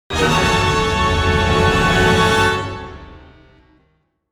Ramp Down, music

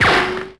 lightsaber deflect laser; weapons

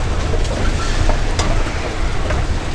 escalator loop; Tony Hawk's Project 8; XBOX

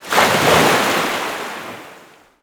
Waves, ambient; Totaled; XBOX

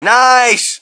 crowd, shout; Mike Tyson Heavyweight Boxing

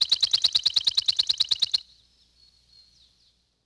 Sparrow Bird; ambience; Project Gotham Racing 2

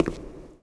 footstep subway

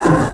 trando block, creatures; Star Wars Obi-Wan; XBOX

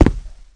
Walk Concrete; Player; Footsteps

